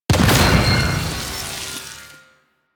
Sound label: Rock Platform Appear